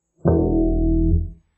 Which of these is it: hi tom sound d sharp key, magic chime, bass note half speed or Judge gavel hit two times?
bass note half speed